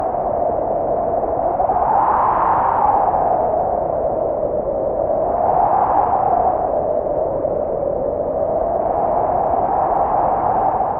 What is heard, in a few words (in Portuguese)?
vento